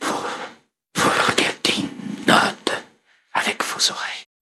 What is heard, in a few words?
jingle